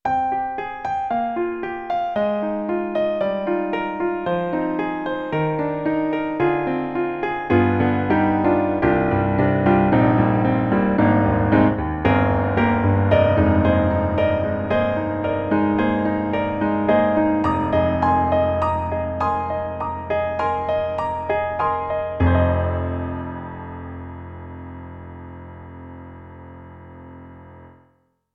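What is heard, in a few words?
Dry Studio, piano; Roland; SonicCell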